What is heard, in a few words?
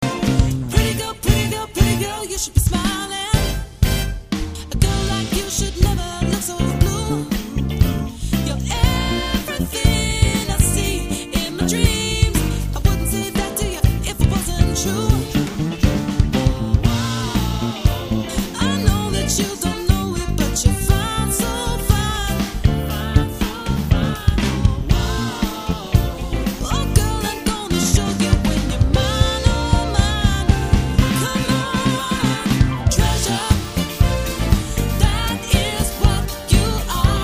jazz